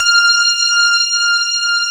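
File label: grand piano; AKAI S-Series CD-ROM Sound Library VOL-7; D.GRAND PF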